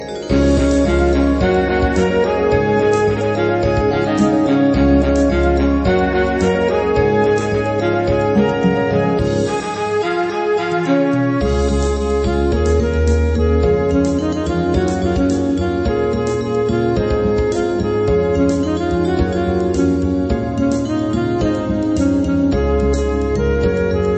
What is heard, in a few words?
winter jazz